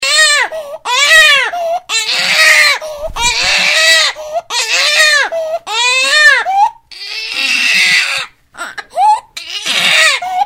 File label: crying